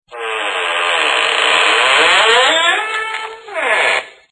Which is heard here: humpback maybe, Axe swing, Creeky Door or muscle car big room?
Creeky Door